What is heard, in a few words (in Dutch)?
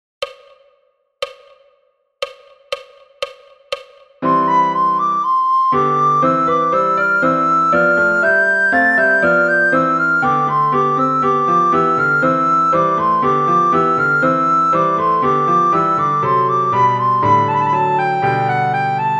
sopraan; Flierefluiter 5